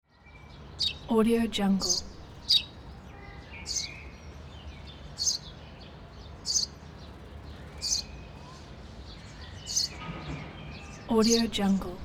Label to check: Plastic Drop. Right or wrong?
Wrong